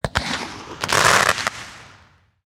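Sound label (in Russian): фейерверк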